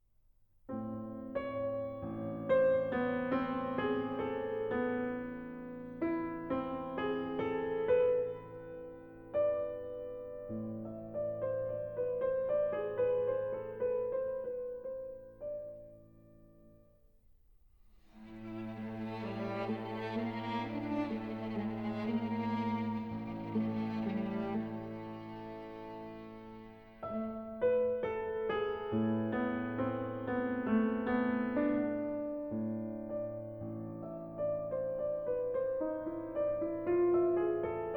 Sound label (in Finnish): Pianokvintetto Elegia; Sallinen; Op. 92